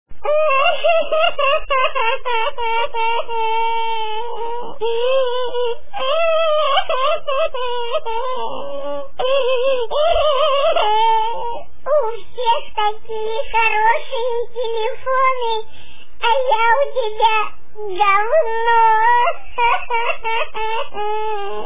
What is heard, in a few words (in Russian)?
Telefon; кричащий телефон